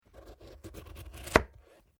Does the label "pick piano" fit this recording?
No